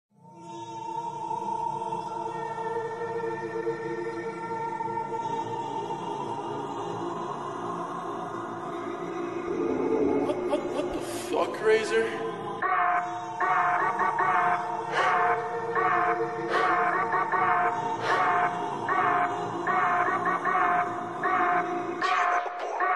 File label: slowed reverb